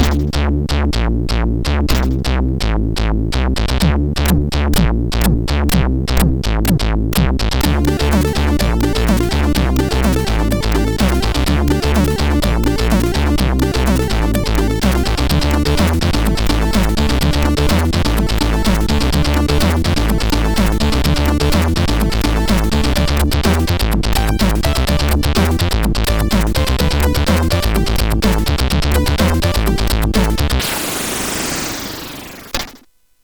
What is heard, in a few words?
Acidlike CSG8580R5; hvsc